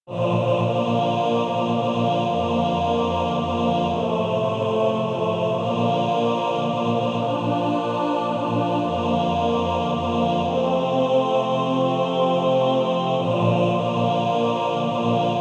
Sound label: Male Choir TTBB